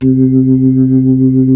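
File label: B-3, club chorus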